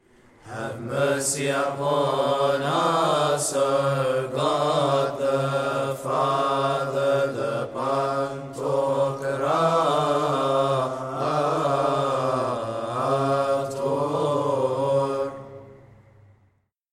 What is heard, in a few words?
Have mercy upon us; sydney diocese liturgy